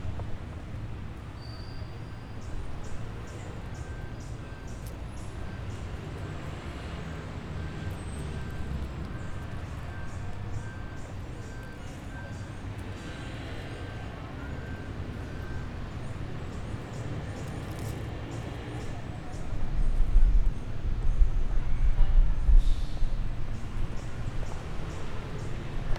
Park Ambient

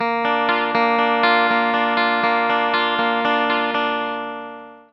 Gtr Elec riff

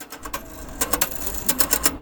Mechanism Activation Sequence

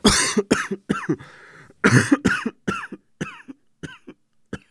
Man Caugh

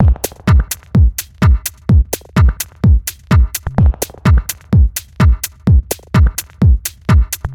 127 Bpm Dub House Drums

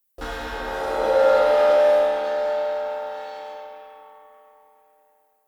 Appear

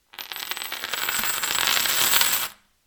Coins Fall

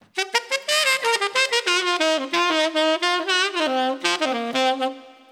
Sticky 8 Beat 90

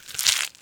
Lettuce Squish

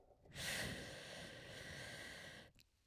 Smoke

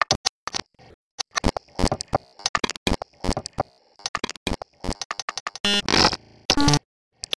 Clicks And Pops

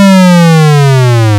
Centipede Flea Drop Sound

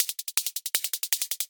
Drum Bass Fast Synth Hihat Loop 160 Bpm

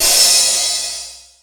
Crash

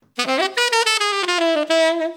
Bebop 4beat Dmaj 110

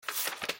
Page Turn

